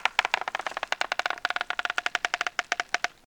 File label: Tree Squeak; moul